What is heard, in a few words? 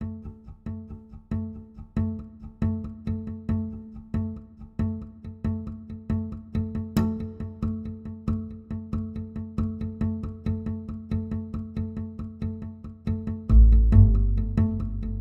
Taut ostinato neutral, momentum, tension